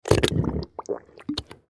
Mud Suction, Mud Suction Liquid Gurgle Thick Submerge; Foley; SoundEffects-Blastwave